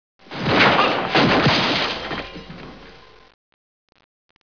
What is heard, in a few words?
EXPLODE; Talking E-Mail